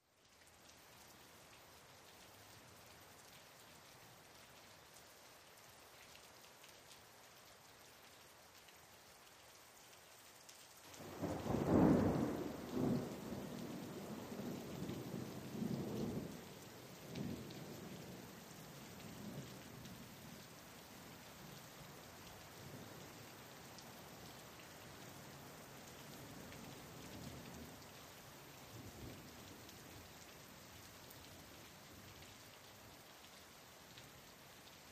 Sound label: Rain Light Drizzly